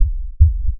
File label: Double Kick